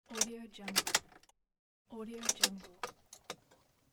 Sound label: car key insert and turn